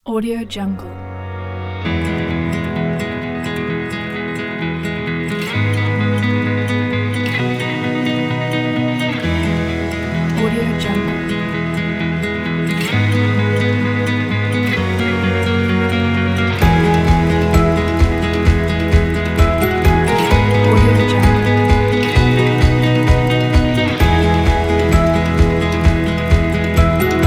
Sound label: indie folk